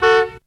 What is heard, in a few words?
car fx; Zero G - Man Machine